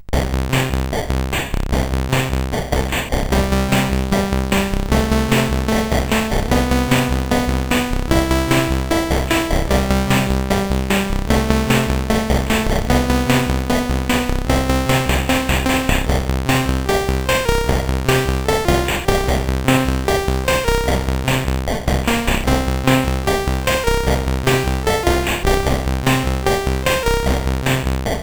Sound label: Amiga Emulator, CSG8580R5; Scheepers Marco